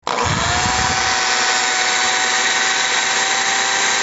Sonido Taladro

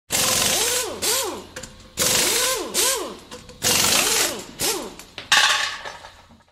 Sonido Pistola Llave Neumatica Air Wrench

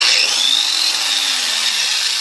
Sonido Para Esmeriles

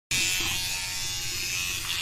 Sonido Cepillo electrico

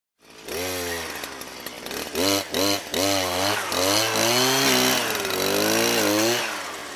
Sonido Desbrozadora

